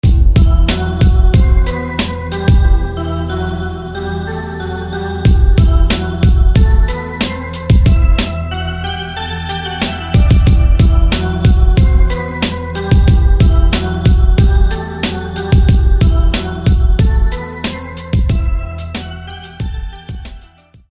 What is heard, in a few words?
Rain Vibes; SFB FX